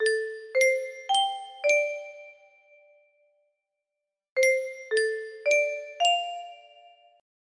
music box, music box melody

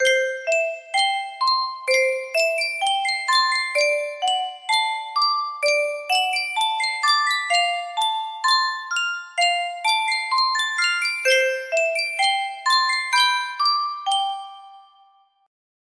yunsheng custom tune music box

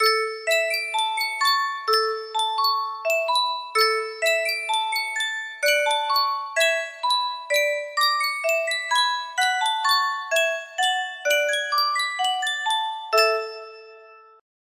music box, music box melody

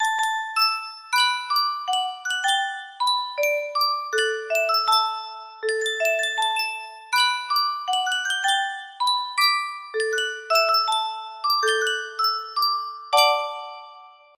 Sankyo Music Box, music box melody

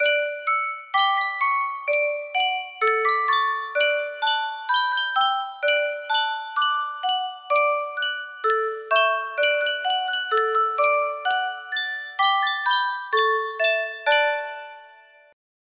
music box; mouse round mending song

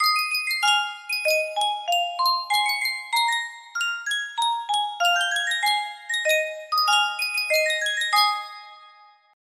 sankyo miniature music box

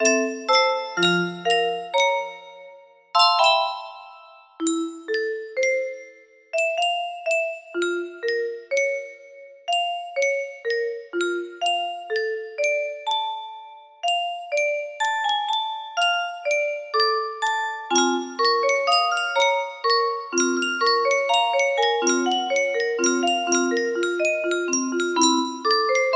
F key, music box melody

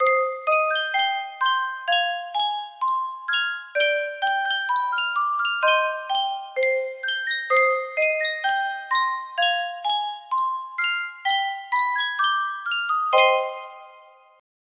yunsheng custom tune music box

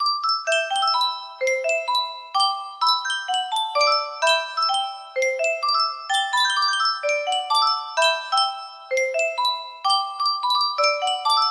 Yunsheng custom tune music box

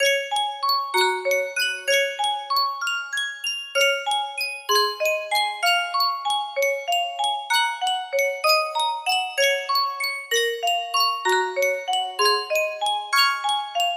Sankyo custom tune music box

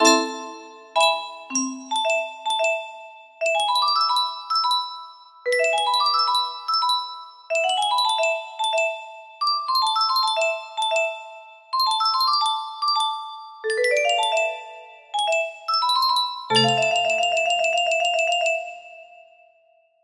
music box; ratatouille

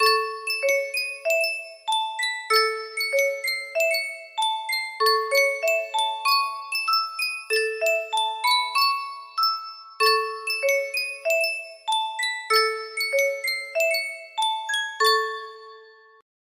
yunsheng custom tune music box

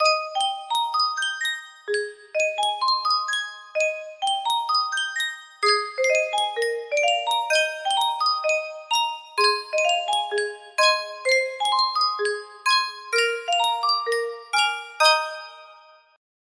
Yunsheng music box, music box melody; beethoven piano trios op 1

